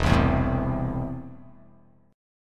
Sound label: E Suspended 4th Sharp 5th E; down